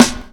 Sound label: clear snare a sharp key, steel snare drum sound tuned to the A# note